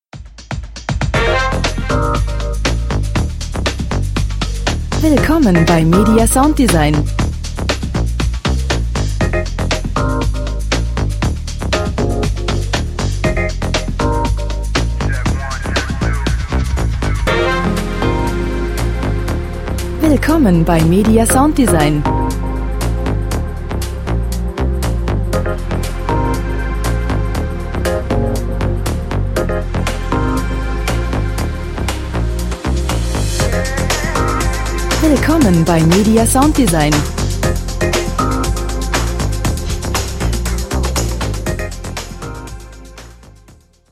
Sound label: lounge deep house